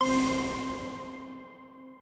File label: spin button up; asgardianstones